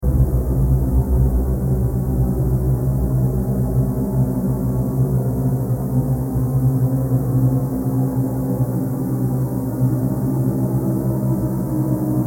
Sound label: Sci-Fi SFX